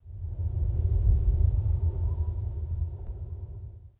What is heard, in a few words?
WIND, wind; zones; zoom3